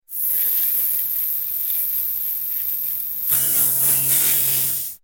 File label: Electrical Buzzing Interference